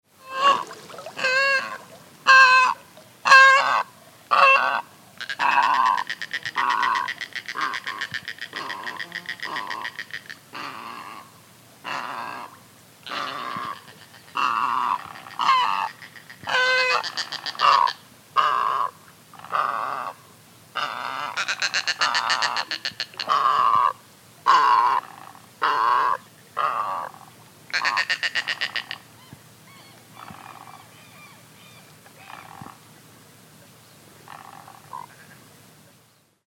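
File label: great white egret voice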